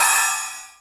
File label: GATED HAT OP, MPC-60 DRUMS; Greytsounds VOL-1